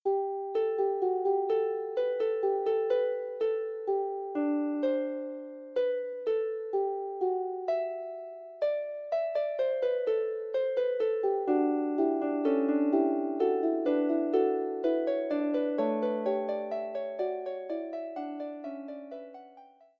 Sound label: Fugue, Harp; Forty Eight Preludes and Fugues Book 1